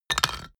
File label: stone tile fall; natur-tiere; steine